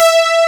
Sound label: SYN, SYNTHESIZER; DIGI WAVE #1